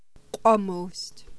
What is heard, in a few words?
PLUCK